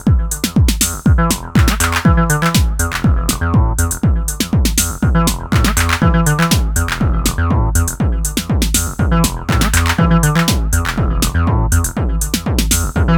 Roland T-8 Beat Machine Overdrive BD Bass

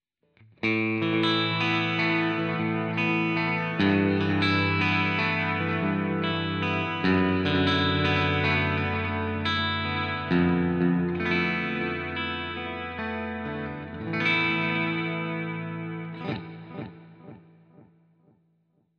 American Tele Echoman

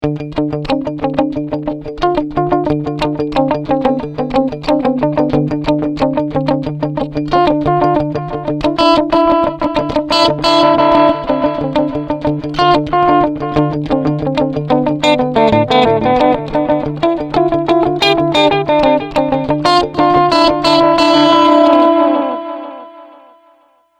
Preset Multihead

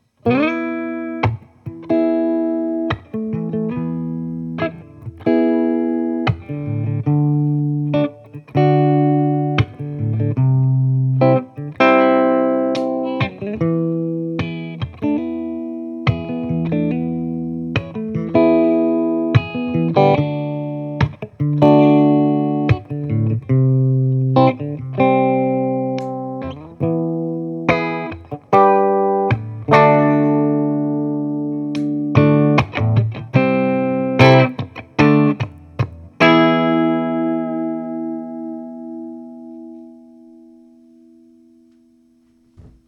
Basic Deluxe

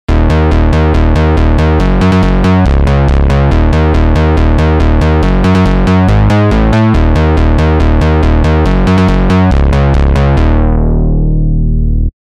Moog

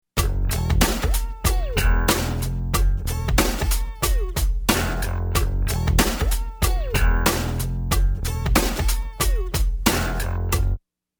Loop DBX

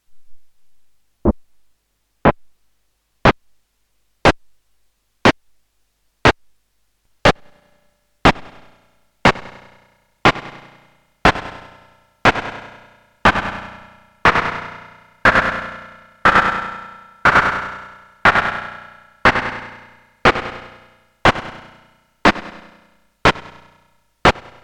Slap; Erica Synths Perkons HD-01

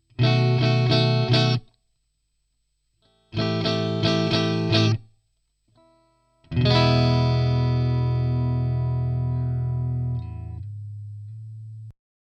Guitar; grace design felix

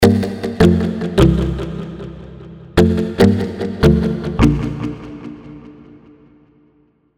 Mute Guitar; Nexus 3

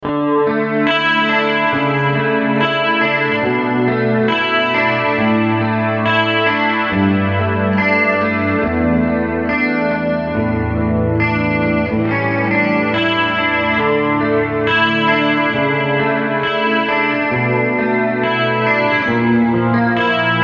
Preset Clean Slate

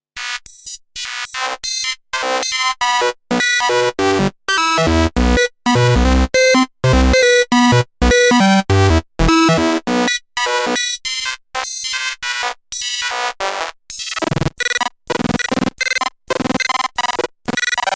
Ringmod + HP

